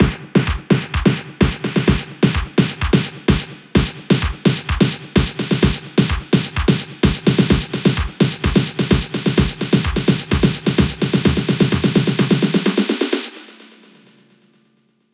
128BPM 8Bars, House&Electro Fills; Americano; Vengeance Samples

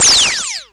Minimal Perc; Minimal Percussion

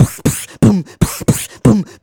Beatbox Loops, Human Beatbox

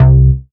Bass C, Offbeat Bass

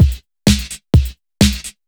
Housebeats 128BPM; Vengeance Samples

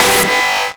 Synths H; Vengeance Samples

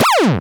Synth Shots; Vengeance Samples

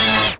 Synths A; vengeance essential club sounds-3

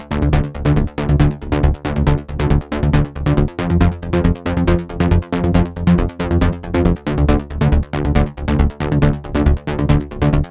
Bassline DRY 138BPM, Dminor; Vengeance Samples; Nightfly Kit

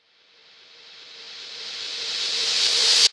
FX Uplifter

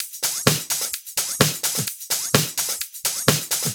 Houseloops 128BPM; Vengeance Samples

